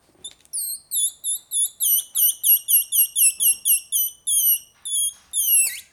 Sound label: Balloon Fun